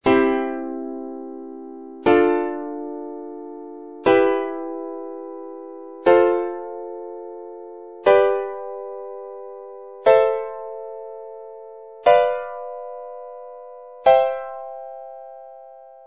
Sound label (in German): stufendreiklaenge, volksmusikbass